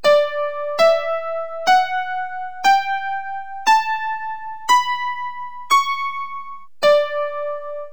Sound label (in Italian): SCALA IN REb